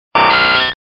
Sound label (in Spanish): Grito